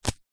plant pull roots from ground; foley

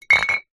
foley glass bottle down on concrete

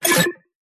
science fiction computer; Audio Mangler

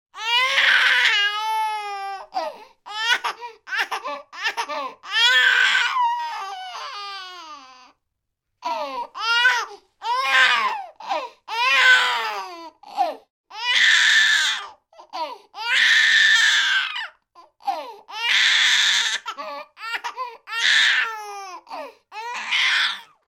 baby 4 months crying

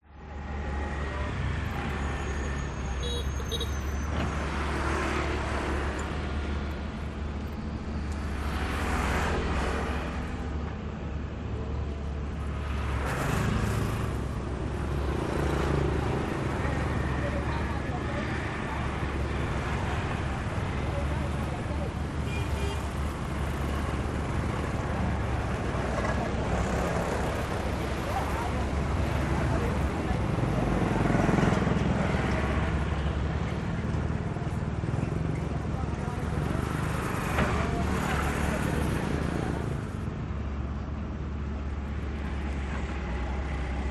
traffic pass by hoi an vietnam

